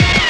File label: HIT, Hits & Brass